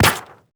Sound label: paper hit; projectiles; bullethit; Max Payne